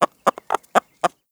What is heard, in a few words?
chicken idle, creatures; Counter Strike Model Pack; CS:GO